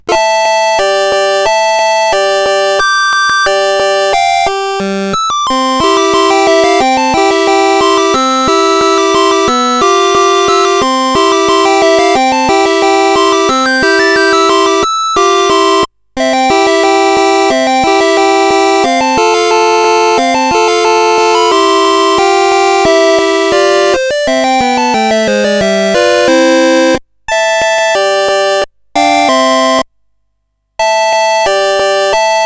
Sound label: Burp crack intro, sid CSG8580R5